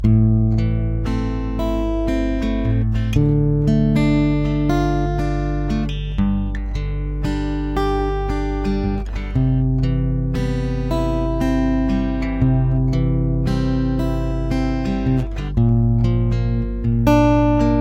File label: Slow guitar filler; backing tracks